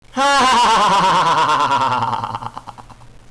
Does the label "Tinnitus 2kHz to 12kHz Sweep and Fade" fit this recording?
No